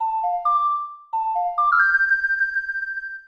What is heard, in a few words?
Ocarina; Nintendo - The Legend of Zelda Majora's Mask; Video Game OSTs